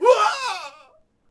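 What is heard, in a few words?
Longbowman Die Vox; Civ4 warlords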